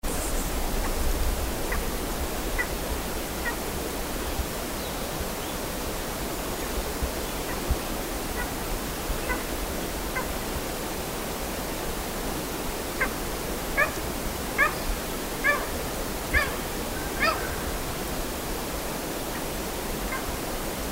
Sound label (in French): pygargue nain, chant